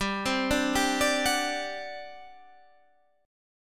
G Major 7th Suspended 4th, G, down, Guitar, Standard; GM7sus4 arpeggiated; JGuitar